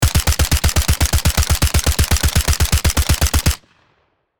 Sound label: Spec ops assault rifle full auto fire; war